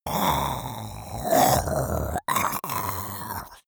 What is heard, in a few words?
monster choking zombie idle; comedy-cartoon; soundpool